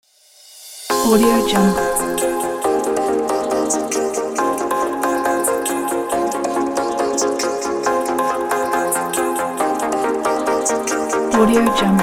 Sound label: this is future bass pack